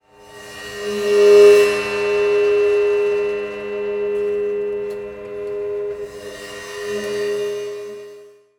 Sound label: Prepared Drums